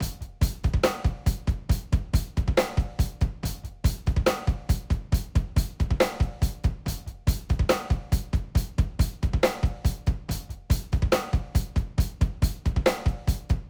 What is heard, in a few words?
rock